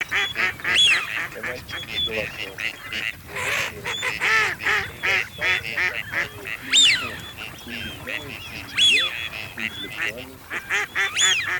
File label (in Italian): Canapiglia Fischione Germano; plurifon